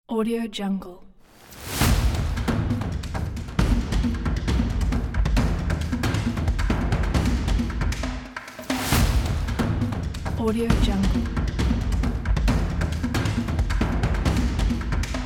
africa percussive

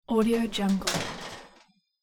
oven door closes with ring off; hollywoodedge

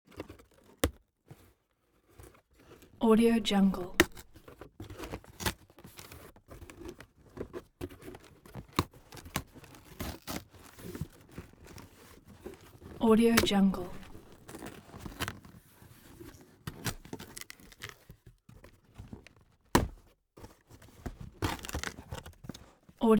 object box cardboard small open handle; smartsoundfx

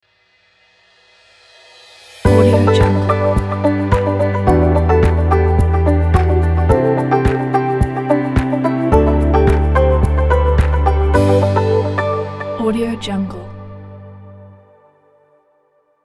uplift ident; elijah-studio

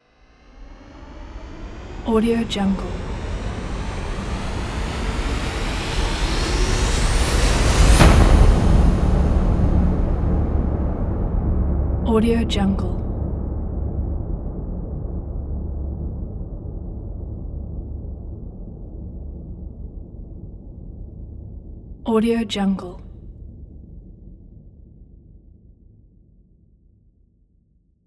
cinematic riser

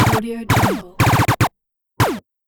scifi laser rifle bursts; stormwaveaudio

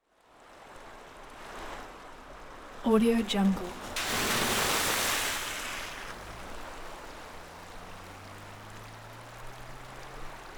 vehicle pass by through water

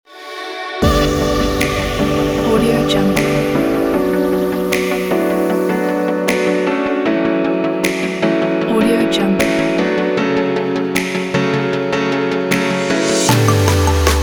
the future bass

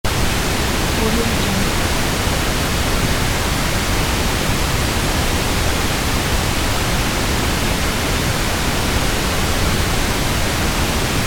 white noise

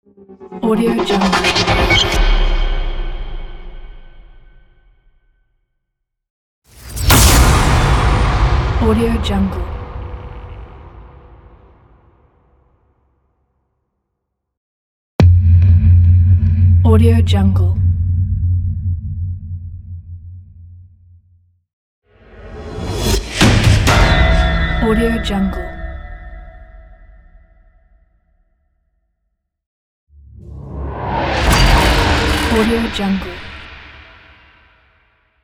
power explosions sound pack

